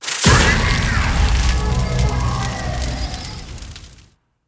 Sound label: dark fire